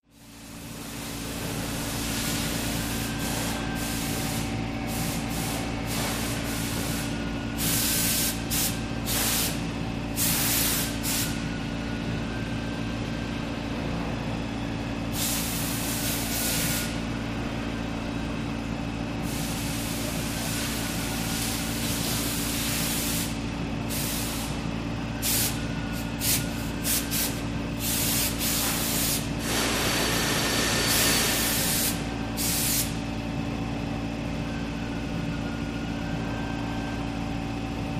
Assembly Area High P

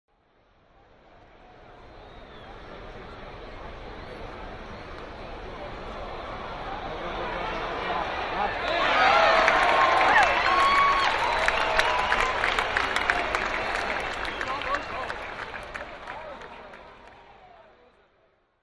Medium Baseball Crow

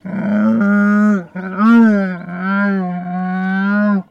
Camel Moan Longer Up, camels

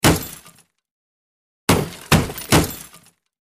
Bullet Impacts Metal

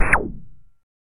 Video Game Fire Effe

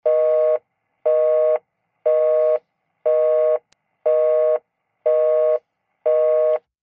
Cell Phone Busy Signal, futz; car & cell phones

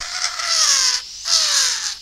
Drill Dentist Torque Down Going Into Tooth

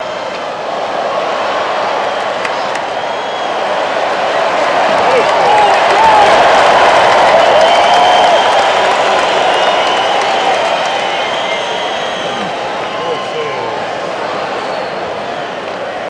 Crowd Cheers Action

